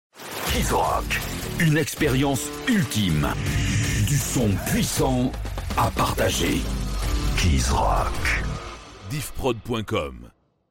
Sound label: Sweep Rock Metal; Kizrock